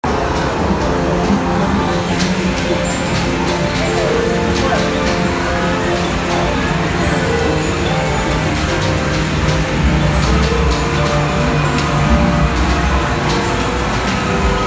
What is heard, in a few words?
tokyo arcade absolute noisiness